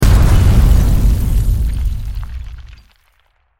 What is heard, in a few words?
explosion debris, Structure Explosion; Bluezone